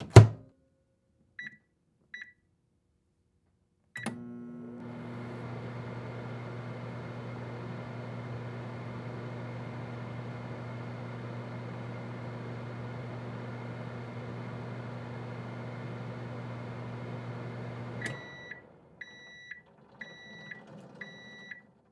Household Microwave Close Door Turn On Shut Off

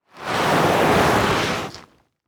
Impact Body Drag Through Rock Salt; Impacts

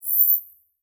Animation Short; Adobe; Multimedia Internet CD-Rom Flash

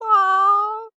Animal Mammal Carnivore Cat Domestic Cat Meow Sad; animals; AdobeCC

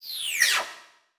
Multimedia Internet CD-Rom Flash Animation

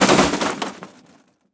Crash DVD Player Tumbling Down Stairs Short; crashes; AdobeCC

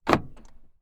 Transportation Jeep Commander Door Lock Interior

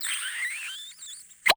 Human Male Kiss

